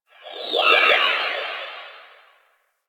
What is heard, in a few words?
Exterior Distant Space Calls, Ambience; Warframe; Railjack